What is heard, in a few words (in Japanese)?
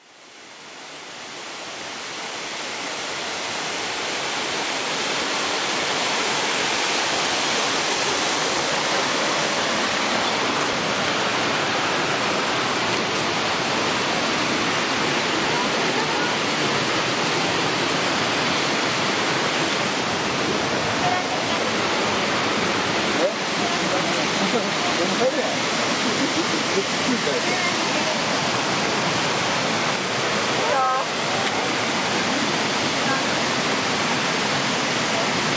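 joren no taki, izu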